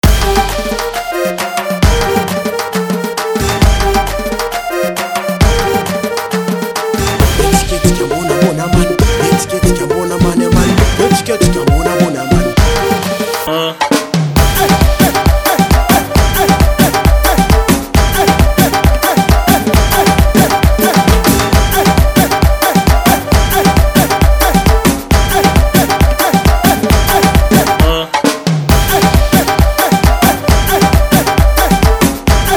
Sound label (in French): Ambiance Accordéon